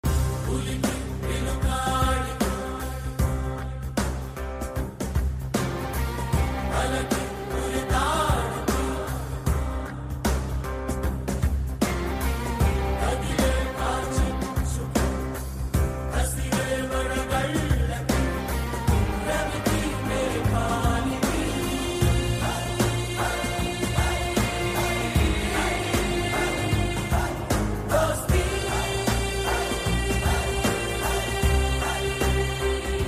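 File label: background music jail fight background music; rrr; dosti; rajamouli; ntr; ram charan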